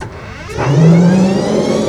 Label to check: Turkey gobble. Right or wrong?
Wrong